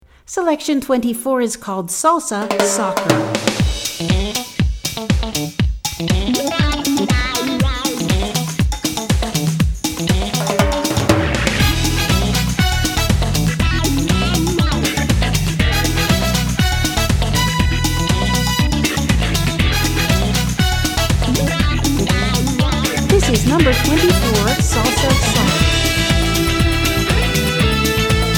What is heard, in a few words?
Salsa